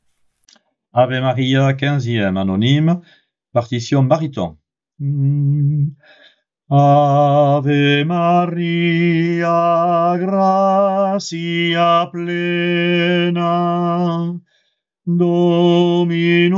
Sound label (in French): baryton; Ave Maria anonyme XVeme